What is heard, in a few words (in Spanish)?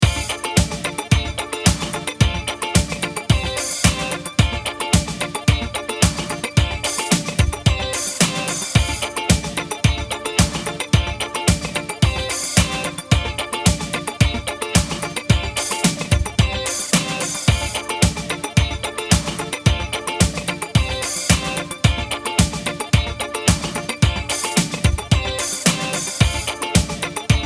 groove de bajo backing track; cómo crear un groove de bajo